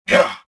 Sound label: land; Star Wars Jedi Knight II Jedi Outcast; XBOX; weequay